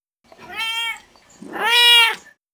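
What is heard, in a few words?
cat meow, interior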